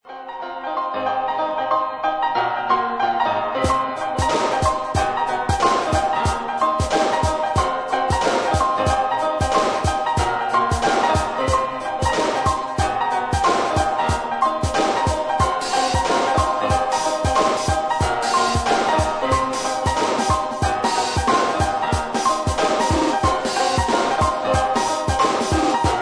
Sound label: AMBIENT EXPERIMENTAL